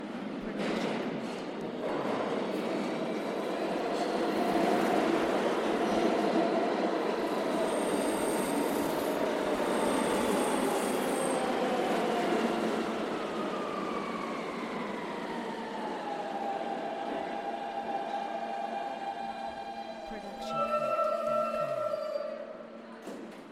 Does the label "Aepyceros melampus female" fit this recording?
No